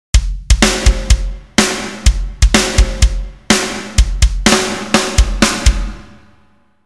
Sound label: Drums kick snare